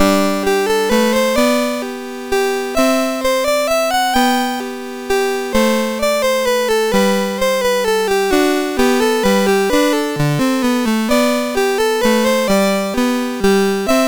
minuet, MOS6581R3

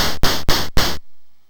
BASIC sid MOS6581R2; Baji; hvsc

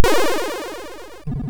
Die Alien Slime, MOS6581R2

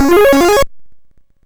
sid MOS6581R3; Toobin

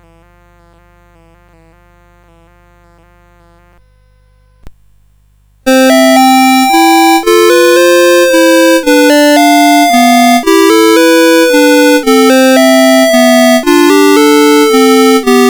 Minuet, CSG8580R5

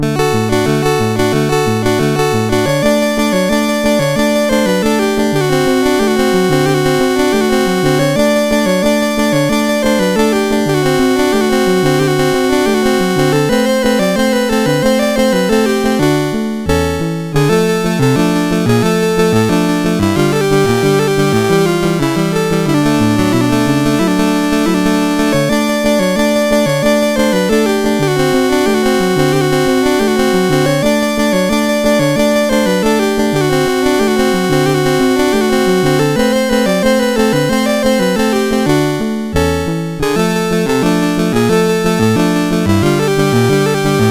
Two Tamburitza Songs, MOS6581R4

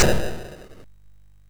sid MOS6581R2

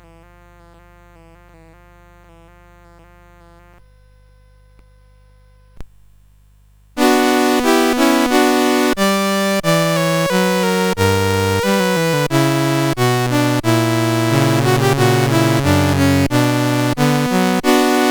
Franzosische Overtrue Gavotte I, CSG8580R5

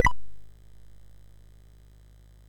sid, MOS6581R4; Classic Concentration

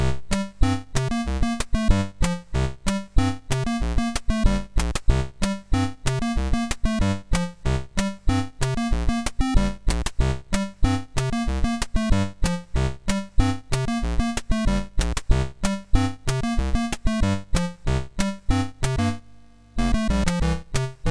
MOS6581R3, sid; Night Knight

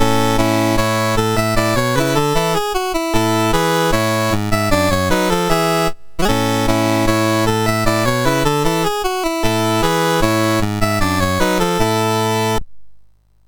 sid MOS6581R3; Games Summer Edition menus

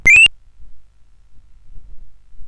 sid, CSG8580R5; hvsc

